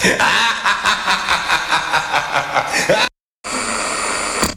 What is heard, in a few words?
radio off